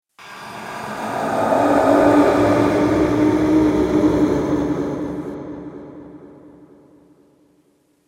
Horror Tortured Soul; Evil Sound Effects; Andrew Fleming; Twistedtracks

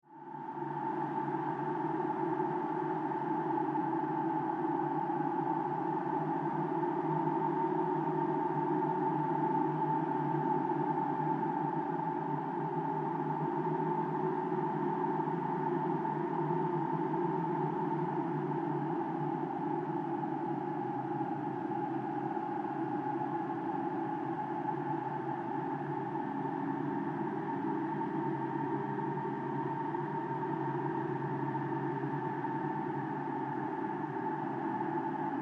Horror Strange Wind; Scary Soundscapes

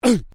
Male Vocalization Hun; Male vocal sounds; Exclamations; Voice

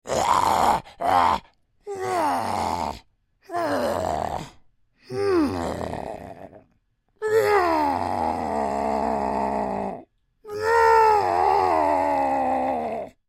Creature Troll Whine Sad; Monsters; Horror

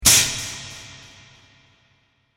Broadsword Strike With Delay; fantasy; Andrew Fleming

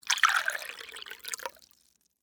Water Pour Chalice, Into, Metal, Cup; Pouring

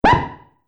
Multimedia Button; Button Sound Effects

